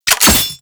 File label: Mech Charged; Weapons; Warframe; Tenno; Wraith Sidearm; Epitaph Prime